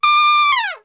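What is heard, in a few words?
D 4 TRP SHF, Trumpet; Best Service; Brass Super Section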